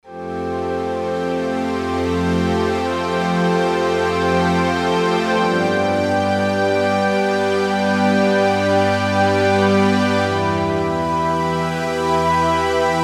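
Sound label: Pray, synth pad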